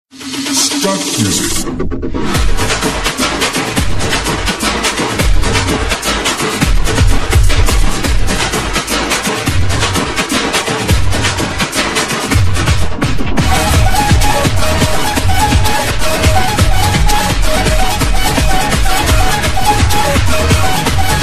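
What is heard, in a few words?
tamil background music ringtone; the greatest of all time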